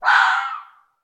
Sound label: animals fox red mating call single; sound effects one